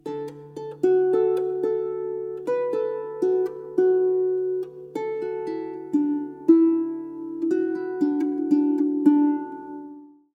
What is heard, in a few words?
Lullaby; Maranoa